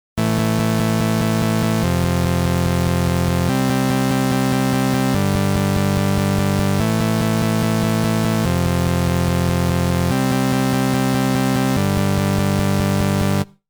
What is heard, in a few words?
Chord